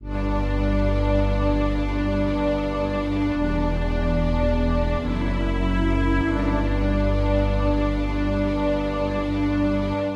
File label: Strings